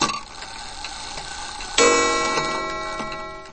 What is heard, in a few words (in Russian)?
perezvon chasov